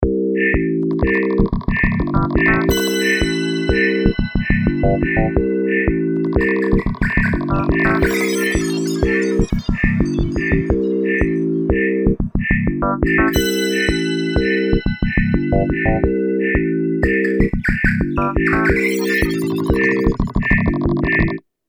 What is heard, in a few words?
jungle